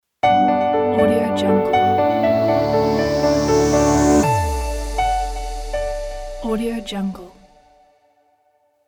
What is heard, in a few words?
motivational corporate logo